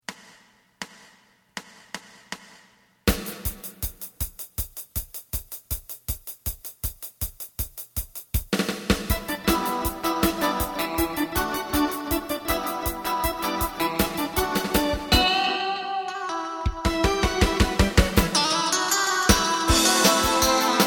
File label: bass